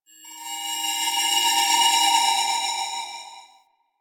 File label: Ship High Washed Bells; Warframe; Corpus Ship Remaster